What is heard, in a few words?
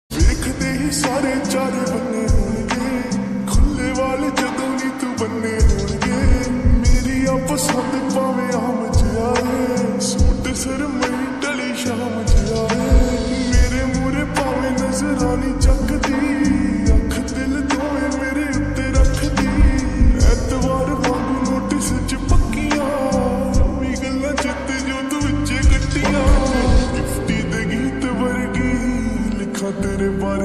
slowed reverb song lofi